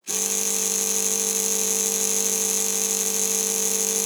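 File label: motor medium, Electric Motors; 3maze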